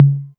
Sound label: tom mid, Novation Drumstation